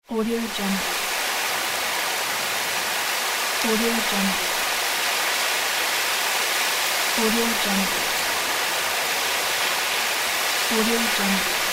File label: rain